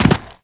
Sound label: land dirt, footsteps